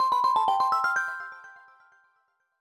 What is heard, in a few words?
Jingle Arise